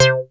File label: MC 202, SEQ-FX; Masterbits; Vintage Voltage